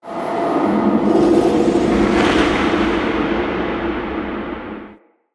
Champion Hub Texture K, Ambience, Under City, Crypt